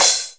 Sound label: choke, Roland R-8